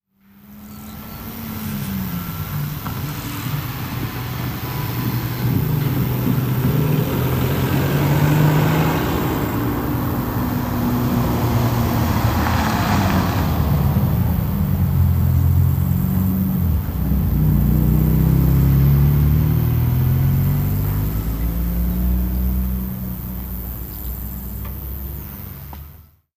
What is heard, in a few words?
Cars On Field Road